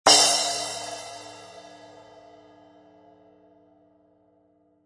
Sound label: 12in Custom Splash, cymbal